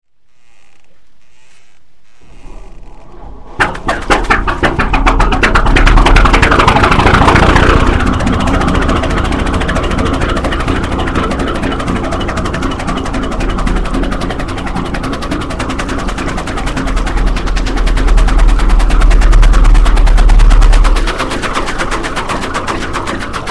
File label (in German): anlassen, jastram